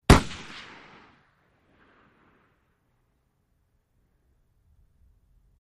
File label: 308 Mauser Single Sho